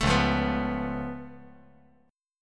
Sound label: C sharp Augmented 7th, C sharp, down; C#+7; strummed; JGuitar